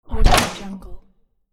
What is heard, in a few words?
shut the door